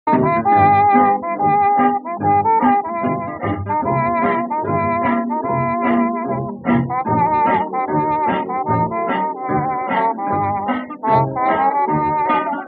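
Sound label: classical jazz ringtone; klassicheskij dzhaz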